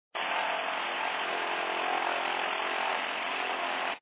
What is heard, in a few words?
Cessna Flight; shadez